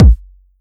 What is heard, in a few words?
Kick, 909 Kicks